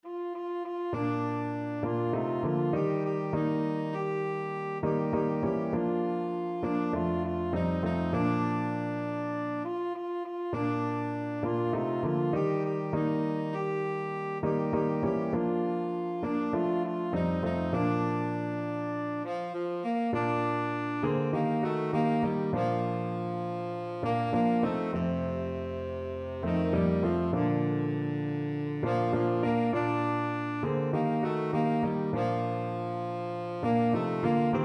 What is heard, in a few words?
how great thou art, tenor saxophone